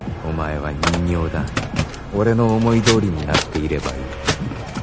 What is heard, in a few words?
toy